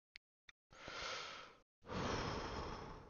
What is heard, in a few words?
houf; هوف